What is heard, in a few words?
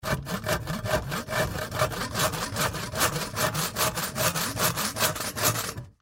Saw Wood 2X4 Plank Fast Cut; Saws; INDUSTRY; Saw Wood 2X4 Plank Hard Fast Cut; Blastwave